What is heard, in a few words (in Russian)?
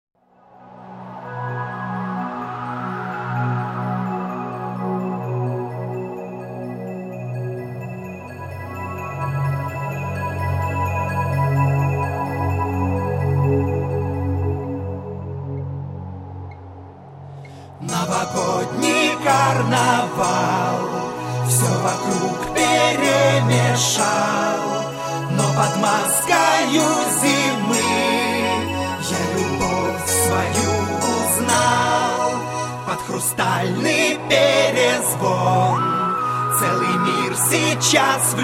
novogodnij perezvon